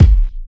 kick drum c key, kick drum one shot tuned to the C note. Loudest frequency: 122Hz